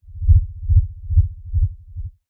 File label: sound design lfe pulsating sub tone; zapsplat